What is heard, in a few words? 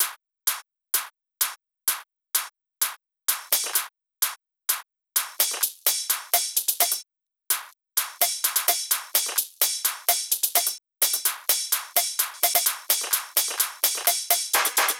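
Drum Loop 128BPM hi-hat Perc; VEC5; Drumloops; Multi Loops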